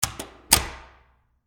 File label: Door Close Public, Foley, Door, Close, Public, Bathroom, Reverb; Miscellaneous Door; Blast Wave